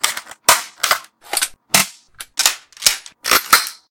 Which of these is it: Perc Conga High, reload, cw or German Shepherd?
reload